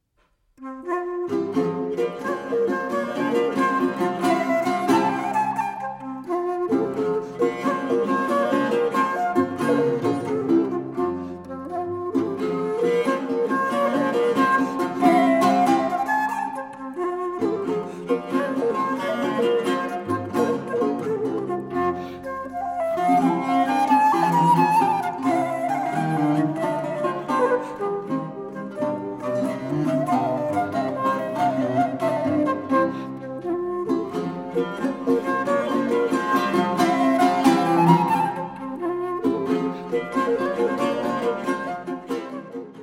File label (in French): suite en sol mineur gigue; voix humaines